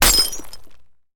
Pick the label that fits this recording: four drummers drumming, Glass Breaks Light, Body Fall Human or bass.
Glass Breaks Light